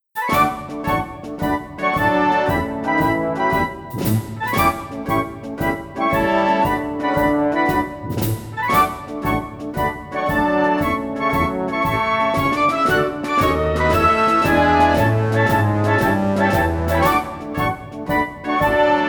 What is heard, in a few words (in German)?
Alpine Volksmusik, Swiss Folk Music, Austrian Stubenmusik and German Marching Music; Best Service; Alpine Volksmusik 2; 42 unique patches